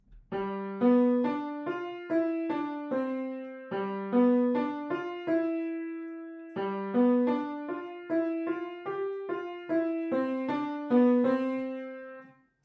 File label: elephant ride